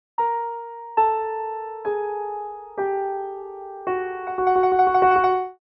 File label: Cartoon disappointment failure